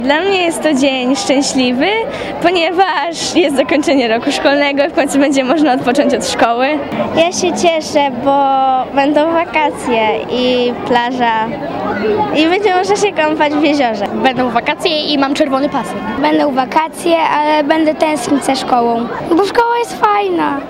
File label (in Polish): dzwonek; ostatni dzwonek w szkolach